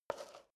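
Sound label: step high heels concrete slide short, footsteps; schritte; menschen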